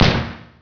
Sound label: BREN; Hidden & Dangerous; h&d demo